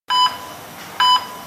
EKG

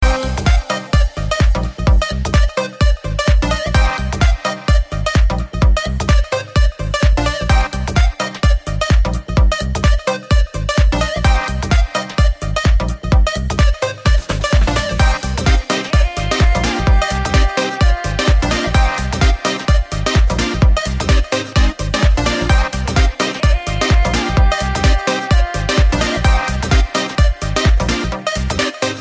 Indian Cool Mix

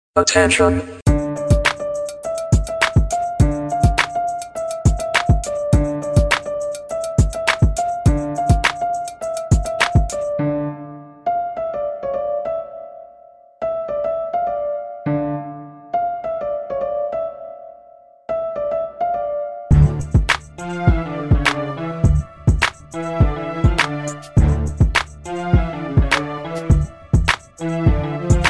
Attention Tune

